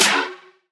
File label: Piercing Trap Snare Drum Sample C# Key, Royality free steel snare drum sample tuned to the C# note